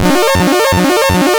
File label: BASIC sid MOS6581R2; Auf Wiedersehen Pet; hvsc